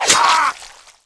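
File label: sword death; AoM-ARTofMyth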